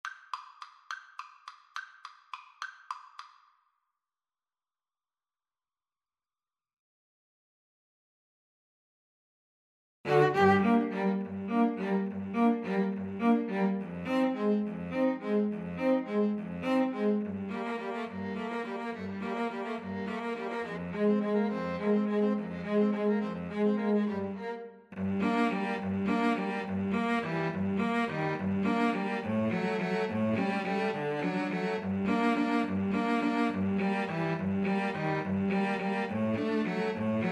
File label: danse macabre, 2 Violins Cello